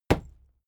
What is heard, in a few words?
fight impact sweetener cloth; krieg-waffen; nahkampf; soundpool